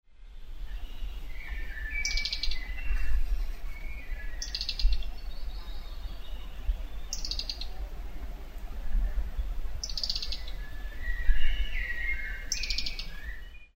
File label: red breasted flycatcher; grzedy